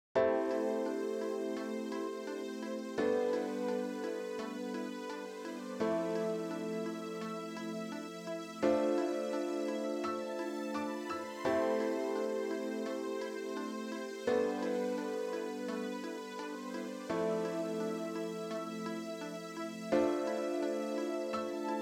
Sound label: music eqed; eiosis aireq premium